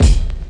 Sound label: REAL DRUM BD